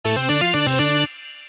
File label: blues rock riff